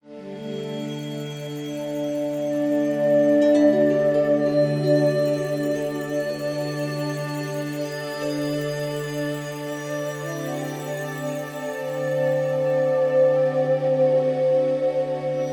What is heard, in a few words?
Neutral Ambient; Magic & Mayhem: The Art of Magic